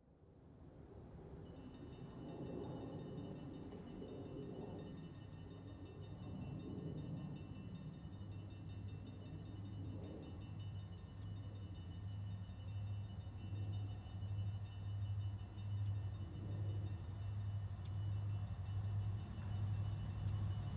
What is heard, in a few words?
Freight train; Travel & Transport